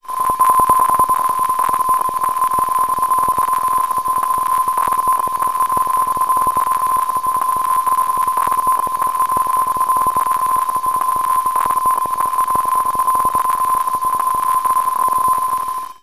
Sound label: science fiction alien code transmission